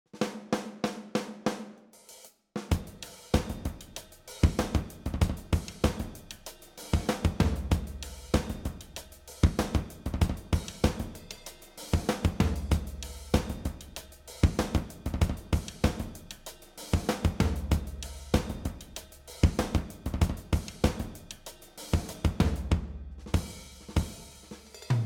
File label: 70s Open Full Funk